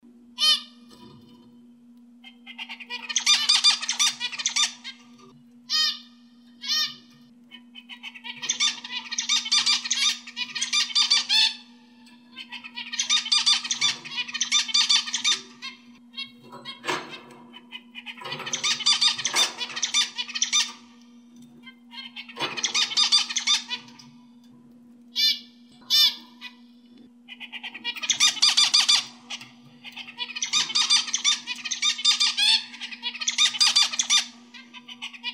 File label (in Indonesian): audio masteran zebra finch